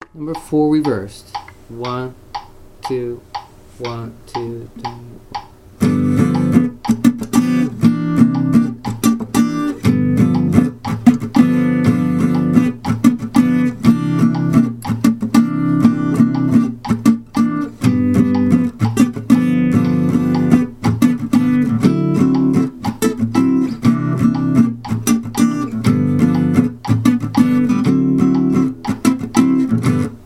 claves original clave comping 12 bar blues reversed